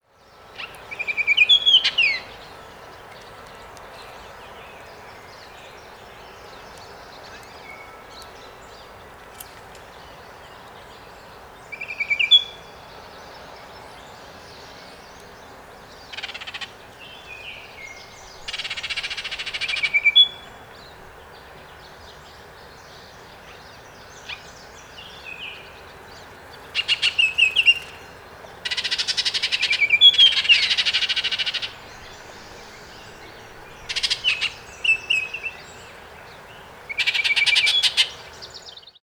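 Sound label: Baltimore Oriole calls songs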